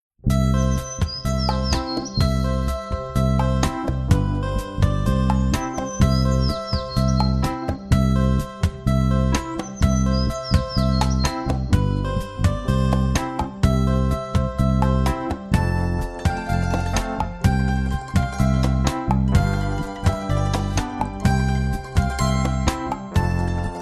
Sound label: singing away instrumental